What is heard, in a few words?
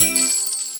bonus win; Amatic; Diamond Monkey